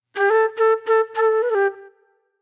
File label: Sparse Flute; Sonic Foundry (Sony Creative Software); Manhattan Flutes; Garage Funk